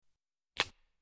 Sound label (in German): knackender ast; Urwald